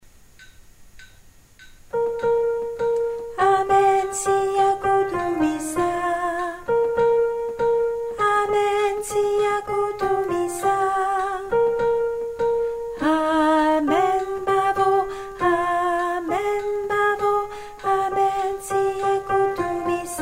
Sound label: alto